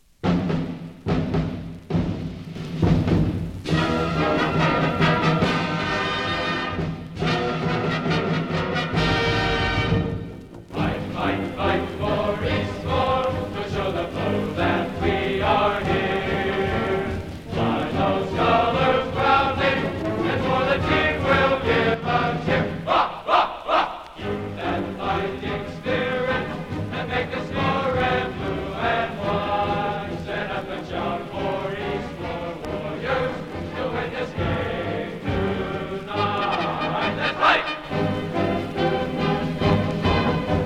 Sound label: marching band fight song